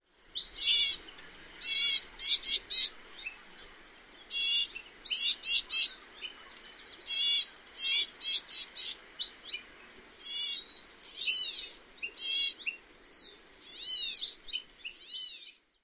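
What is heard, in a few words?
California Gnatcatcher calls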